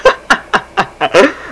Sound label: laughter; Sound Library 2000; Wayzata Technology